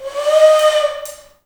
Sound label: flutes, Heart of Africa; Spectrasonics; Heart of Africa 1